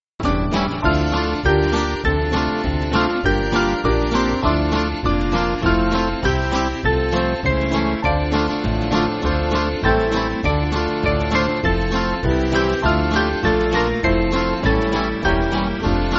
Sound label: Band; Forgive Our Sins; Detroit